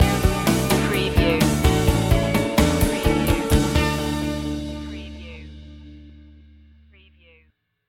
corporate ventures stinger

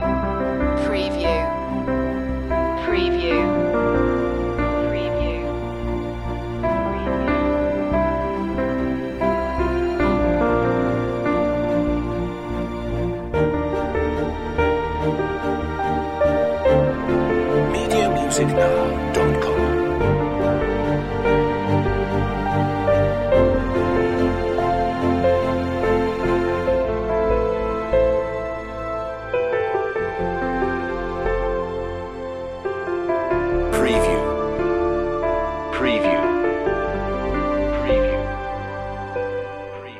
hope loop

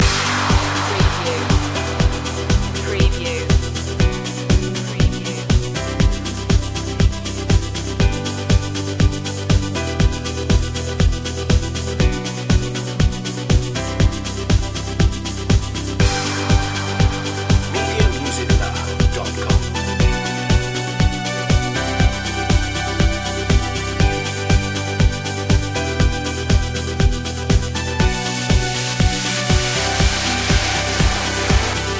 rise above loop

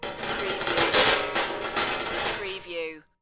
Metal Drag Scrap Clanking

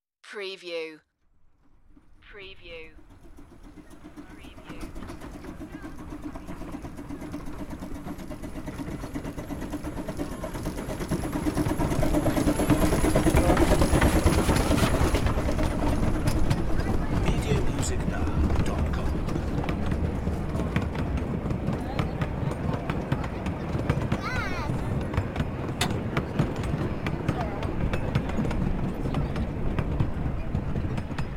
Fairbourne Narrow Gauge Railway Steam Engine; beanstalk audio

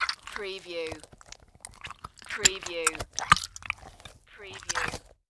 Monster Eat Flesh

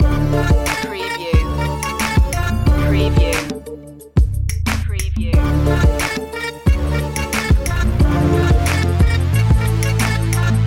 jungle stalker loop

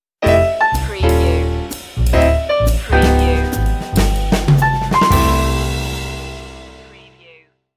lounge lizard stinger

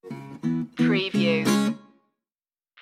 blues stinger

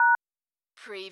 dialtone hash

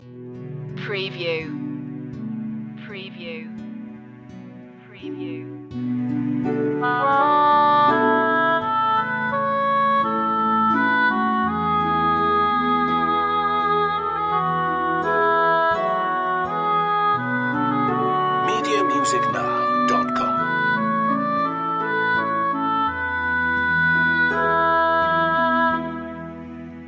gentle sounding

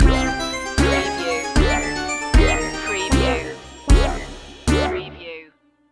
retro video game circus stinger

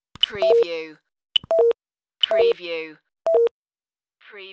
phone error beep

